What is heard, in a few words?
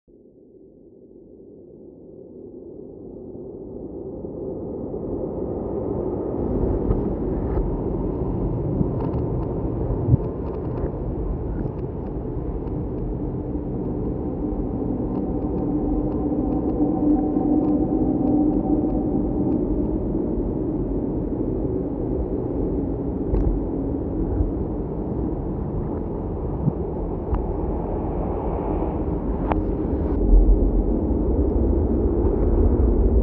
Wasteland, Ambient; SFX; Ambient - General